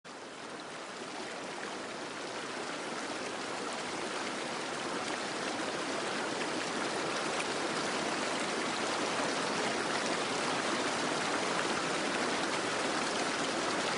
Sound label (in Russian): Vodopad